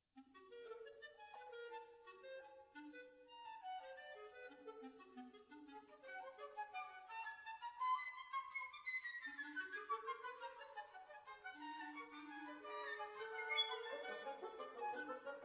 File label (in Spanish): Musica para Banda